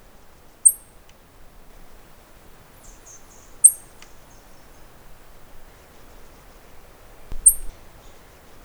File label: Eutamias sibiricus alarm